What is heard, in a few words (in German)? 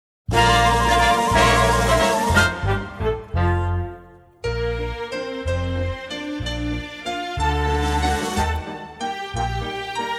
Ein kleiner Walzer